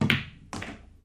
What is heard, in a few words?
Billiards Shoot Sink